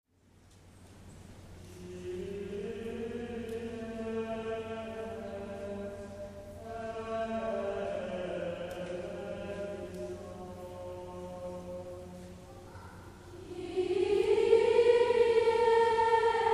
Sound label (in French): kyrie gregorien; concert europe caen